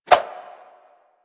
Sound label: Spheres Throw Whoosh; DE; Warframe; Weapons; Solstice Spheres